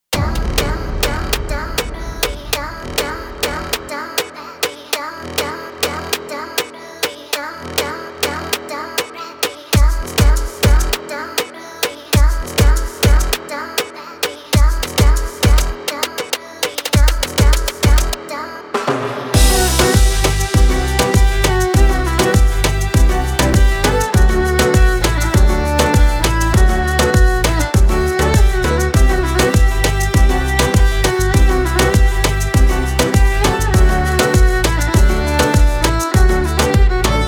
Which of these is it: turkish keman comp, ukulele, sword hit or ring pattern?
turkish keman comp